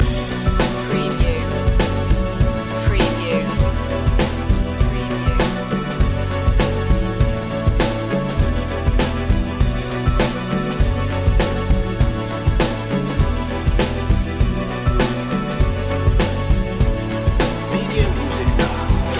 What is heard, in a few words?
electron loop